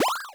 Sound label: MOVE; C64 Dreams; Multi-Sound Default